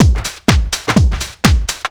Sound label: drum loop 125, vocal house; PS-28 Vocal House Samples; drumloops